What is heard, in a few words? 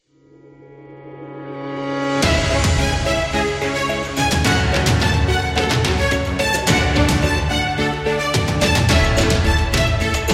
Luxury Cinematic Motivational; medical background